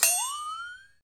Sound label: PRC FLEXAT